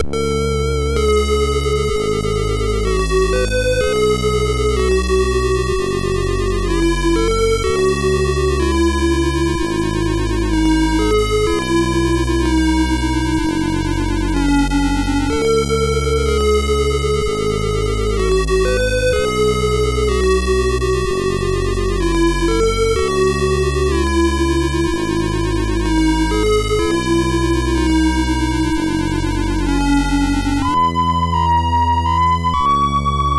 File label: MOS6581R2